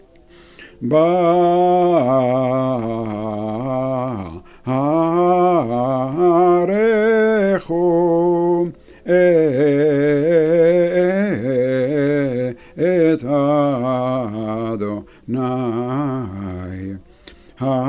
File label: Barekhu